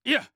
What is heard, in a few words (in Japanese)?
攻撃